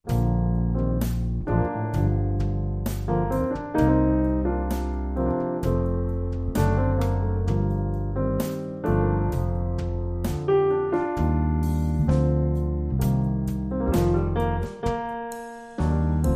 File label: With Trio